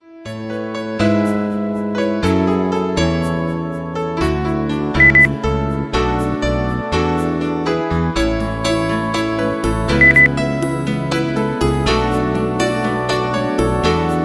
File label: pop beep